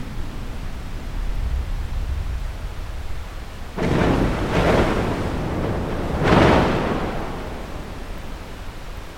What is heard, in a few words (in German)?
eisbrechen